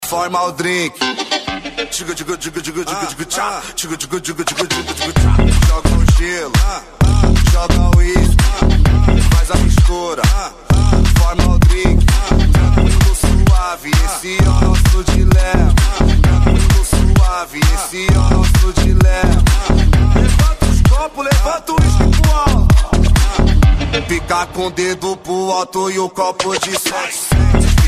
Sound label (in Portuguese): pancada e eletro funk